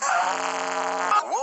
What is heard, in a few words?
scream triggered